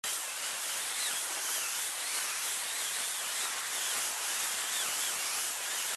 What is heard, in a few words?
Hang Slide; Fancy Pants World 1 Remix